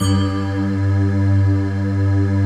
D50 Stak, Roland

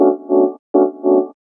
D&B Keys; neuro

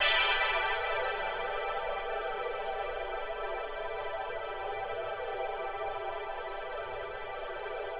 120 Pad, Keys, Nu Jazz House; PowerFX

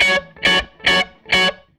SG Guitar Loop

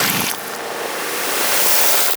SPLAT FACE; Spectrasonic Distorted Reality 1; SPLATTERFACE

